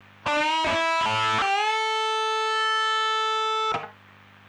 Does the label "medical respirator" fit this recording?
No